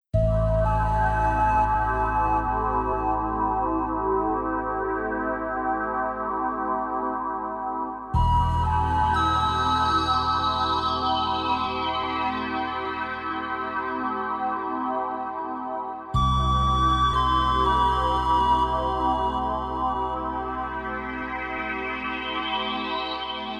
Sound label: celtic whisper, 432 Hz